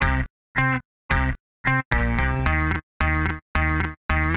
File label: 110 Chill Pop Stab Guitar Low Cmin; Pop Rock; Chill Electric Guitar; Pro Tools Essential Loops; Digidesign